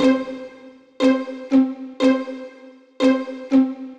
120 C string, Trance, String Loops; Trance Explosion Vol1

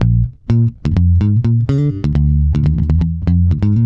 boogaloo A#, bass; Spectrasonics; Bass Legends

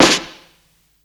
snare roll, Psychedelic Drums

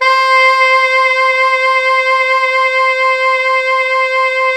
Pop Brass; Best Service ProSamples vol.19; AKAI

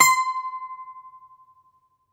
Greek Bazouki, plucked strings

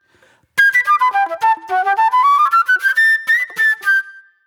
Agro Fusion Flute

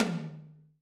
Hi Cannon, Alesis SR16

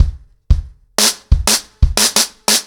90BPM, acoustic drums, drumloops

